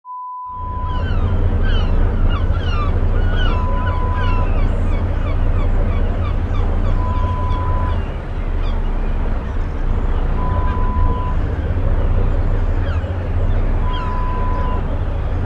Fishing Boat sound

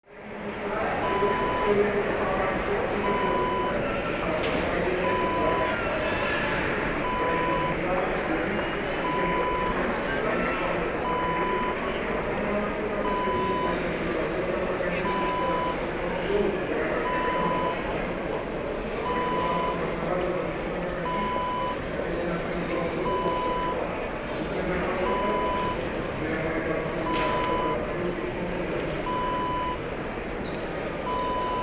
airport terminal

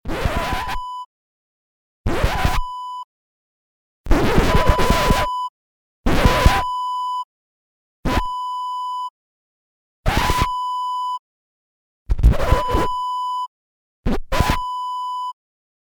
record scratch pack

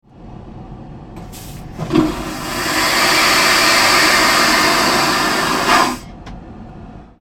Airplane Toilet Flush

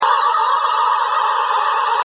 Alien Voices High

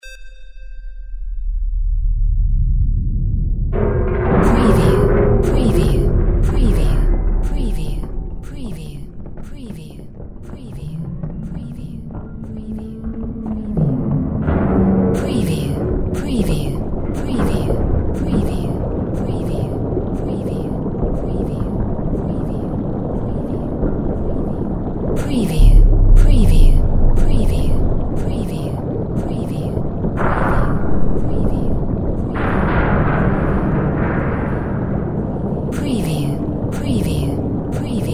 CRASH UNDERWATER STRUCTURE

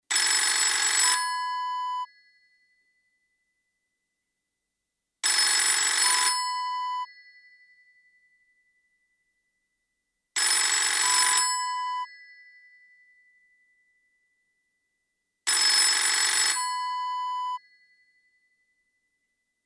old telephone ring

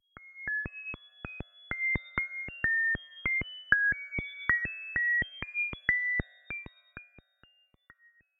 Sound Design Ambient Drips Space Signal

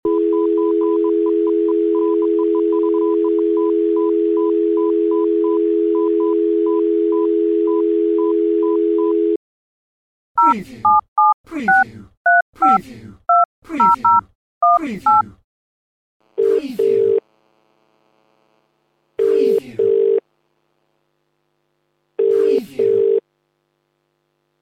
All Telephony

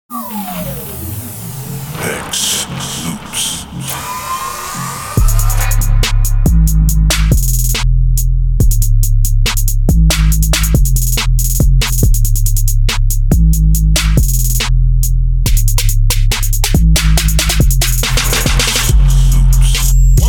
trap drummer

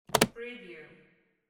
briefcase lock

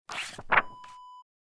page flip

